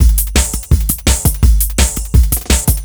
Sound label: Dance Beat; Dance DJ; Drums; Beats; Pro Tools Essential Loops; Digidesign